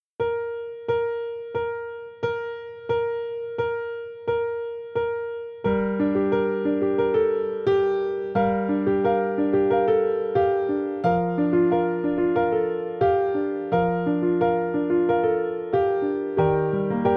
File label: Piano